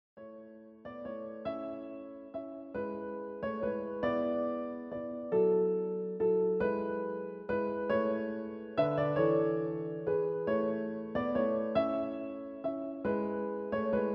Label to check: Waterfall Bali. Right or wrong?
Wrong